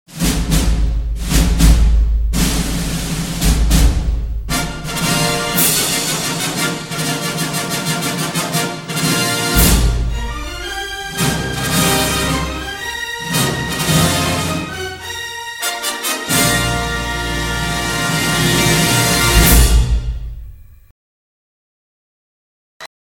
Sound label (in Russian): Fanfaryi